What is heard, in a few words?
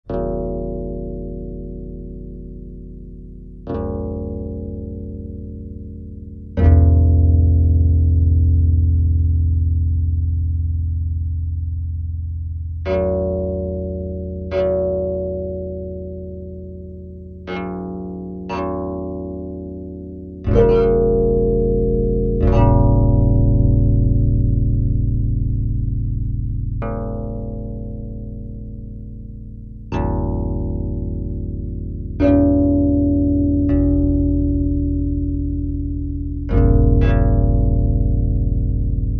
string